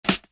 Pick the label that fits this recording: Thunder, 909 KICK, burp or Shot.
Shot